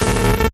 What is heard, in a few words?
Glitch One Second Swarm